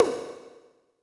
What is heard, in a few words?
Percs, Elektron MachineDrum; SPS1 MKII